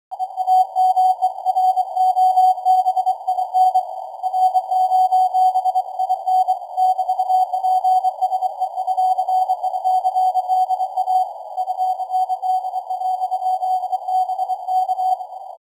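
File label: CW nLMS noise reduction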